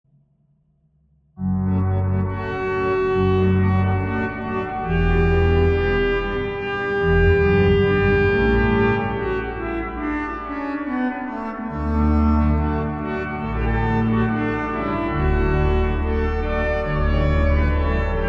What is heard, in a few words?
Andante Duet; Mendelssohn Felix; Calkin; I waited The Lord